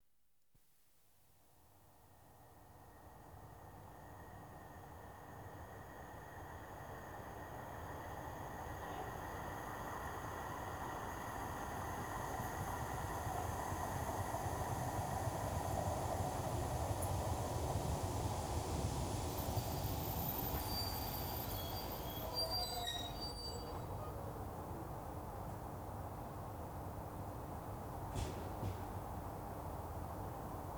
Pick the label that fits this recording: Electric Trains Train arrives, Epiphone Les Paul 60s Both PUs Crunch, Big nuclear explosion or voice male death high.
Electric Trains Train arrives